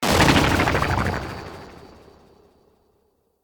UI Common Hud Achievement

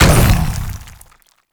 Bowl Land B; Warframe; Grendel

